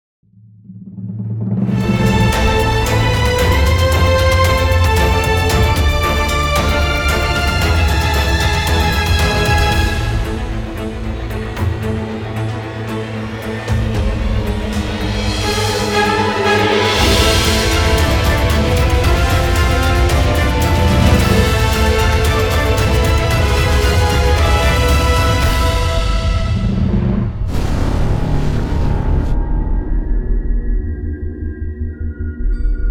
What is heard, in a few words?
Moan Attack, Tension 공포